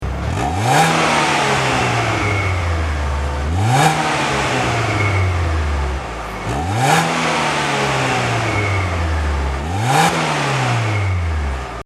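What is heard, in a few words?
blip, muffler; 147 ts type2; unicorse-italial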